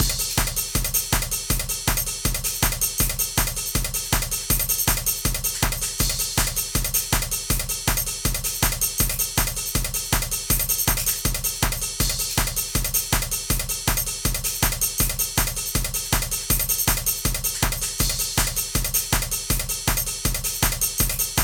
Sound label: GoodEuro drum